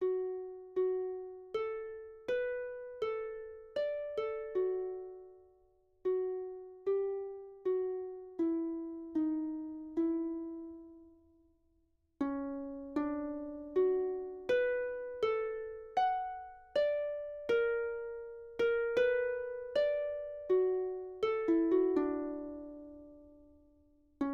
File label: aboriginal mother melody